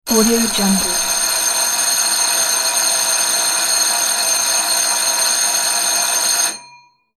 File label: old alarm bell